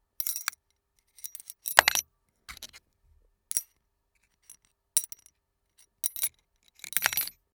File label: tool box